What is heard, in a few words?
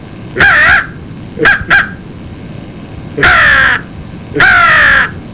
recognition, crow